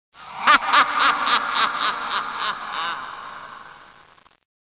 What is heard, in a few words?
evil laugh; kitsune; skaapsteker